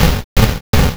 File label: knock